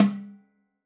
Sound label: timbales High Center; Raw Perc One Shots; Slate; SD SEISMIC Drum Sample Pack